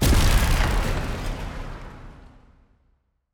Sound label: gravity snare failure; Stellaris; grand archive; ships